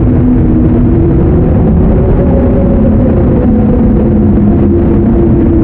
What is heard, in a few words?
dark wind; PC Gamer IT CD 41; Daikatana